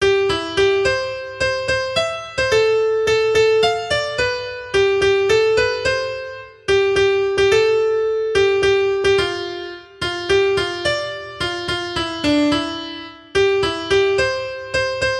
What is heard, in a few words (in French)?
valse; boeuf trad